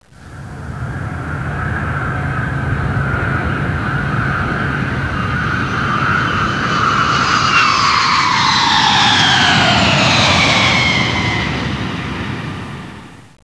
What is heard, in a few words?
JET